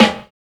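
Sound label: death snare; Drums In Your FACE!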